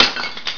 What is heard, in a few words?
CERAMIC, IMPACTS